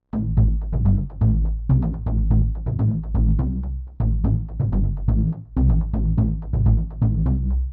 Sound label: 124BPM JUMPY BASS A